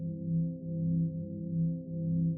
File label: announce loop; Apple iOS 18; HeadGestures